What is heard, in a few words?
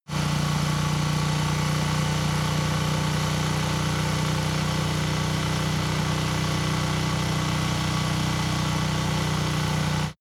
motorcycle engine low constant rpm ascending; motorraeder; verkehr transport